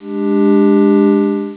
Power Off, phonetones system; Siemens; Xelibri 1

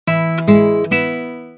Acc Guit; PlusFourSix

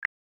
HW Touch; Samsung Galaxy Mini 2

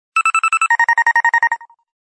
Short Note Beep; LG VX9700 Dare

